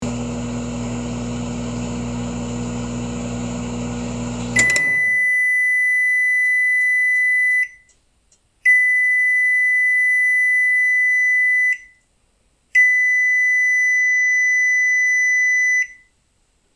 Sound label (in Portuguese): beep microondas